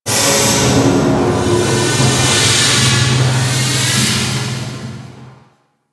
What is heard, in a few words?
Multi Steam Jet Machine; Ambience; Warframe; Corpus Railjack